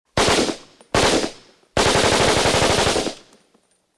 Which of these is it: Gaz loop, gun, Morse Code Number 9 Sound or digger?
gun